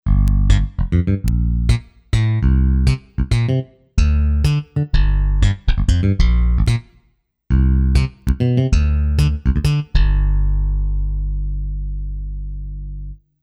Slap It

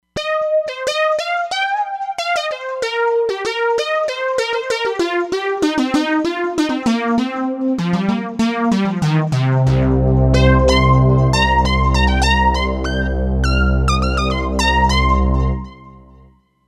Modular, Roland Fantom XR

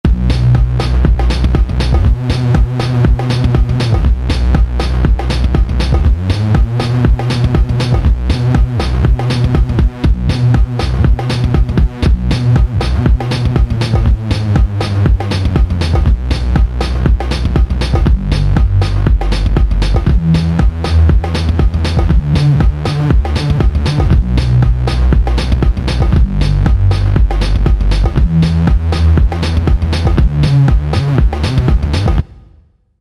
E-tronic; Roland SRX-05 Supreme Dance

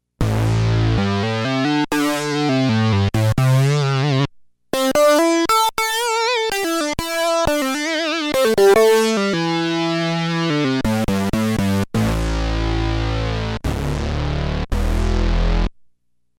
Rock Unison

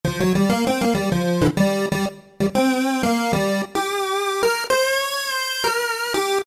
Harmonics Lead; Roland PN-D50-03

